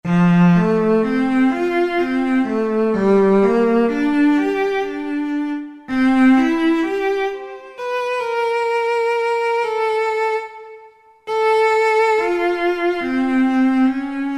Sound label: Solo Cello, Roland SR-JV80-16 Orchestral II